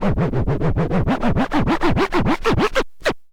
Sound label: scratch kit, vinyl